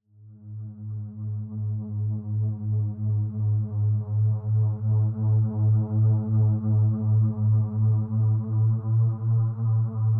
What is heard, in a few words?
Feed detuned, Feedback FX; 99Sounds; Dark Engine